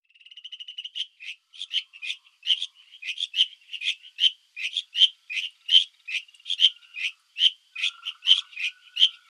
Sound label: Buergeria japonica, Tree frog of Taiwan; 日本樹蛙